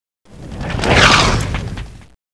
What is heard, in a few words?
mortar; feldfeuer